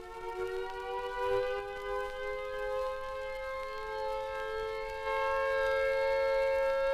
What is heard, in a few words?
BRITISH AIR RAID SIRENS attack; WW2; WWII; Sherfield